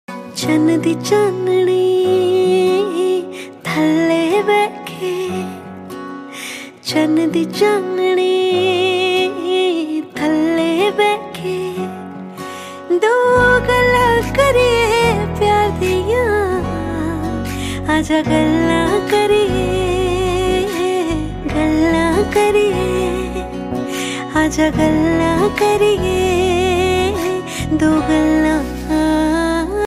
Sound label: punjabi Song Ringtone